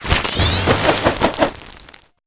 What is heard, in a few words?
fighting game source foley synthesized robotic unlock mech